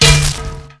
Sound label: bush; Mafie 1